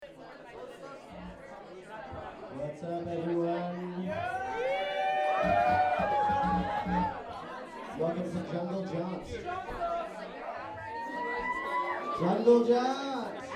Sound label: crowd